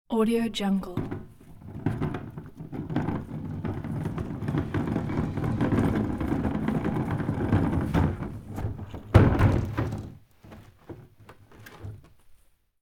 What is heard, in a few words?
plastic trash bin rolling; domestic sounds